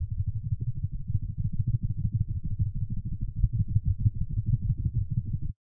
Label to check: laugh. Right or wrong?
Wrong